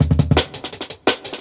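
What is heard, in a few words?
Jungle Breaks, 170 bpm; Burst; Original Jungle Breaks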